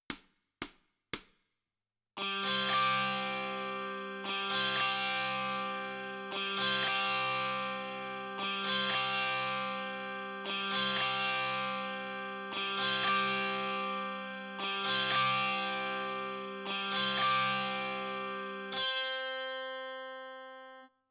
Guitar

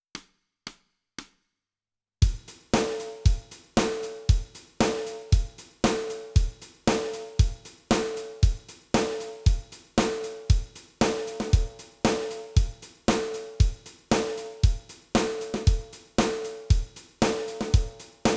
Drums

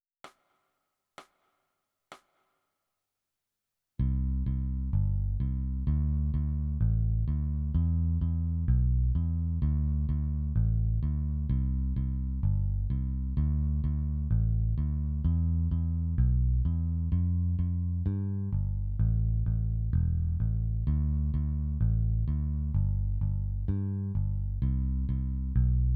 Bass

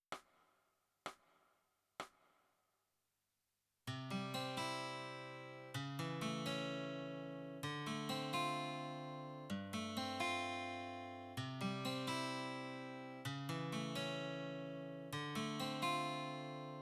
Guitar

